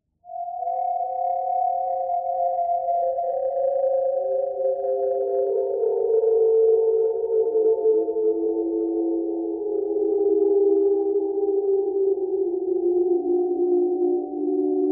bird theme thread noise

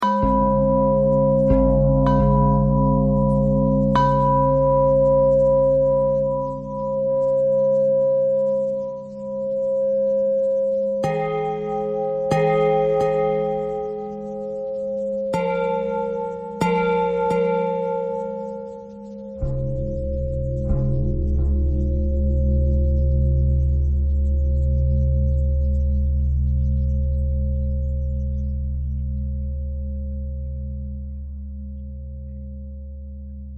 bell thread pentatonic

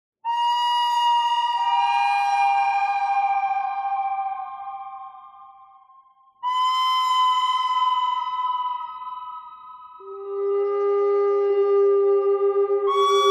bird thread harmonic; noreverb

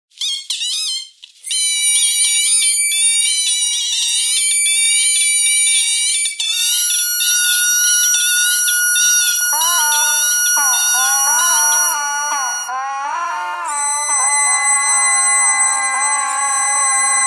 bagpipe radio thread noise

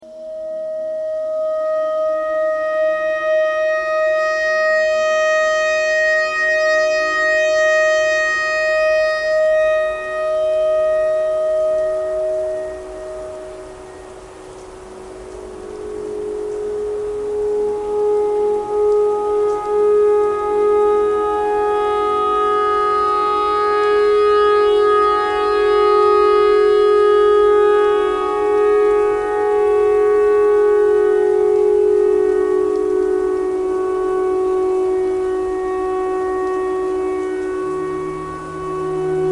clarinet notes e tornado siren thread simple

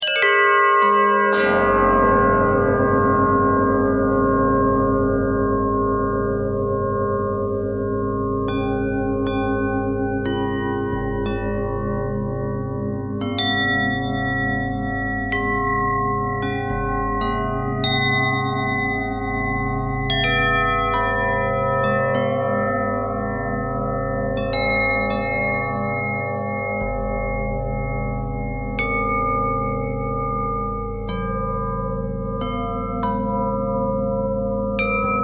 bell harmonic twist

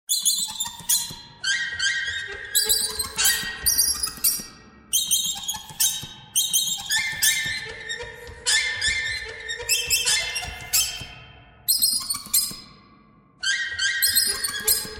birds thread noise

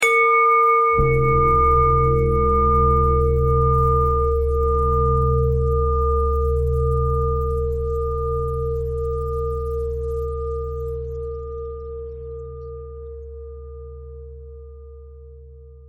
bell thread pentatonic

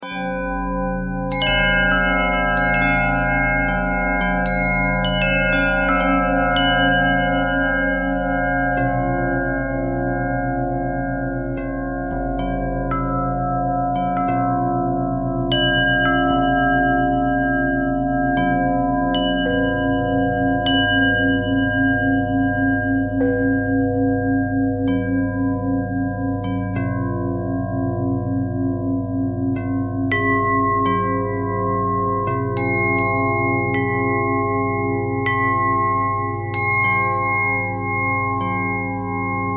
bell bell thread simple, bells

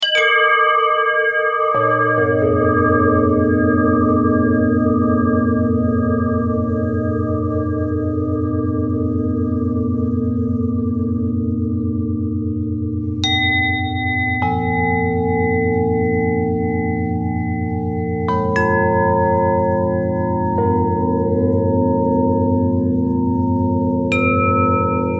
bell bend twist, bells

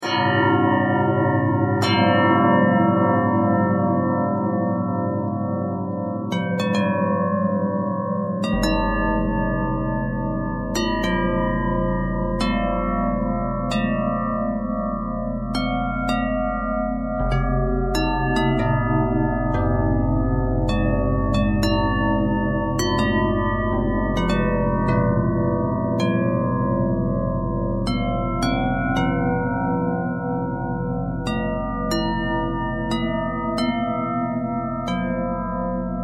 bell twist

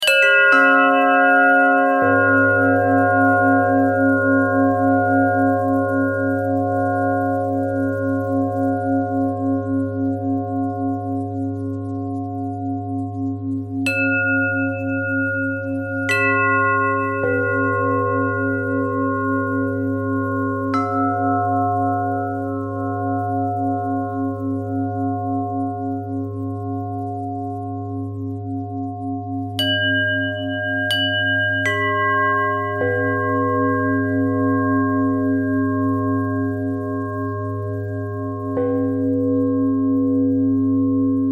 bell thread simple

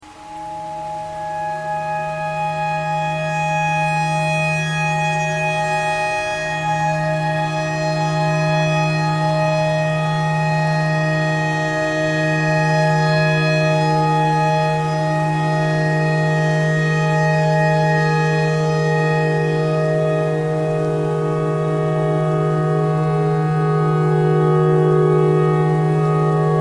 clarinet notes e tornado siren thread simple

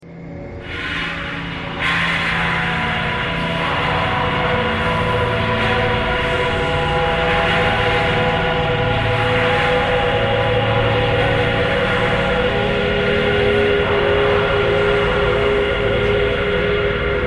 traffic core birds thread pentatonic

